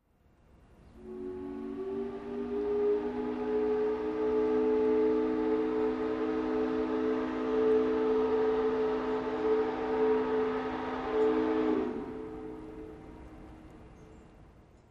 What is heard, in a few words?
Large Factory Whistl